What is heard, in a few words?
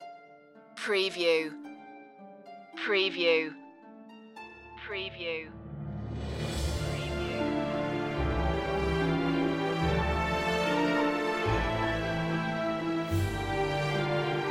no choir